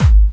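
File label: snare drum bass drum, Dance Mega Drums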